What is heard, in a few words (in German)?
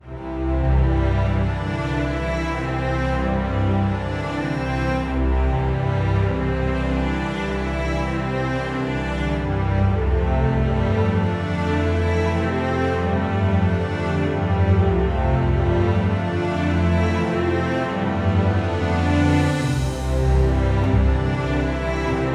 Epischer Flug Horn Thema